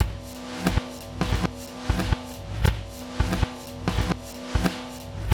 Beat, Hip-Hop

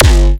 Kick BPM150 G

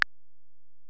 EPerc, Alesis DM-PRO; 300 Drum Machines

